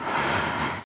snore; dogz

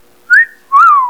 CAT-CALL; Gold Medal Software Volume 1; music; digi wave

